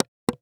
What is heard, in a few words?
Technology Electronic RCA CD Player Buttons